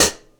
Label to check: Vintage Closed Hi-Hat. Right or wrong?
Right